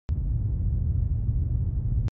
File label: drone spaceship large rumble